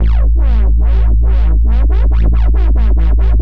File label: wobbly dubstep bass growl 140 bpm; 2 bars